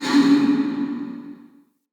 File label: Ghost Soldier Pain, Vocals; Enemy; Wandering Ghost Soldier